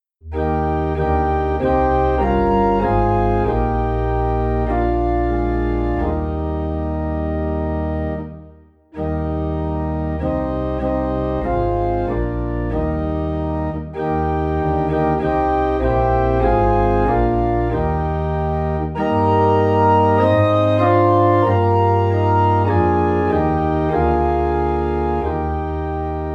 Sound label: Organ in E Flat